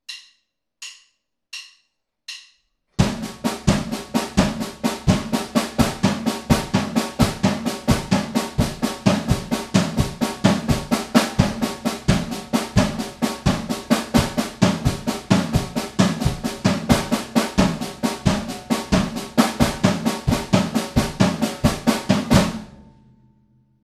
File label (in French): triolet